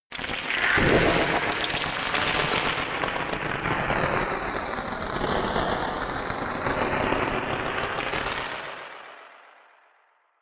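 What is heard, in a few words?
water feature, science fiction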